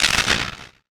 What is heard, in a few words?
Noise Hit; One Shots; Drums & Drones